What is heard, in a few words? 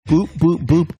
boop boop boop